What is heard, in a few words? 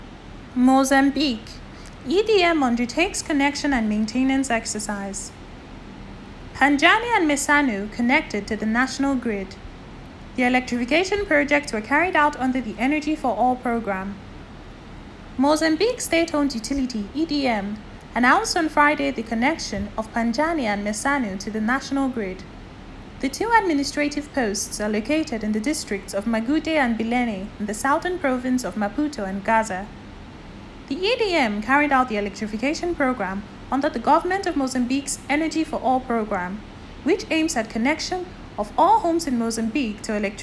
EDM; Mozambique